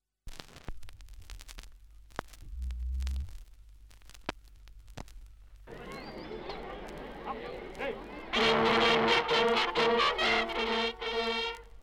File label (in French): musique fanfares provinciales